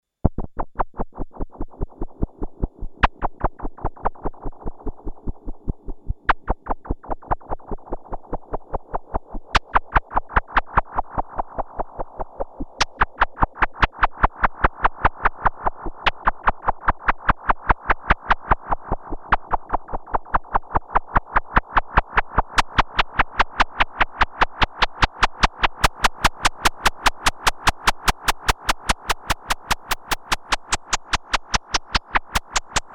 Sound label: Analog Solutions analog percussion sequence triggerd by internal 16th pulse; Ample; analog Echo